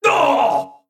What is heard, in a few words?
Male Mech, Death